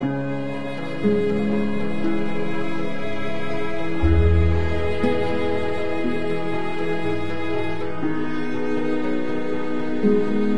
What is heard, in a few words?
Neutral Loop